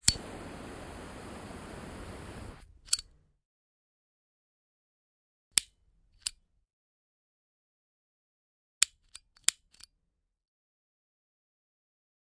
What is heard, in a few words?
Lighter Cigarette Met; lighters & matches